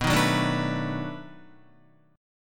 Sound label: B 7th Sharp 9th B, Guitar; B7#9 strummed; 4F,4A,5C,5G,5C,6E; chord shape; 6,6,6,7,9,7; JGuitar